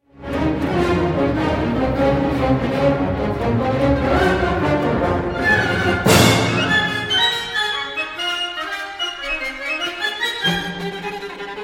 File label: violin concerto in b minor; sir william walton